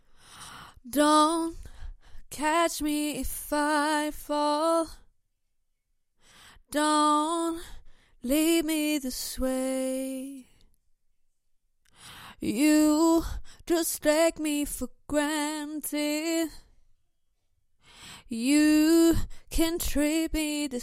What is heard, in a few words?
acapella, pop 82 Am